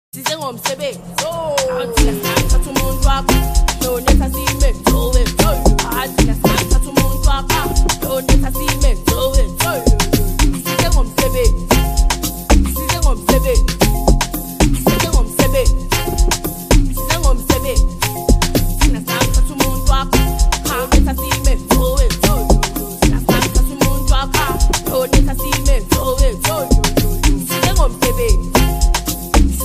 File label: Piano